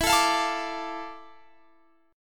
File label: D Minor E, chord; Dm/E strummed